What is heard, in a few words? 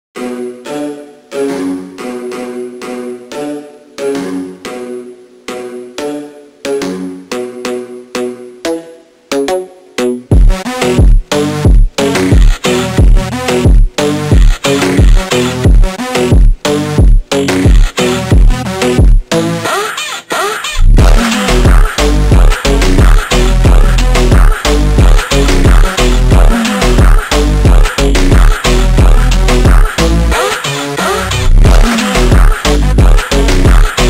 slowed reverb krushfunk krushclub